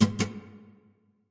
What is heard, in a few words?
Nylon Hit, Guitar Body and String Hits